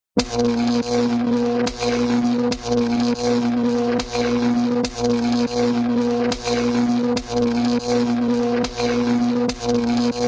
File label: electricity electric hum and crackle; cartoon